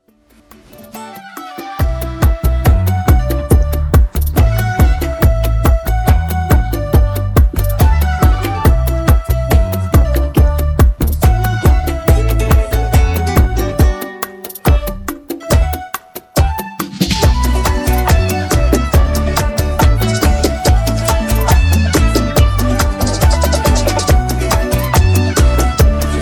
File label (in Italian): Bachata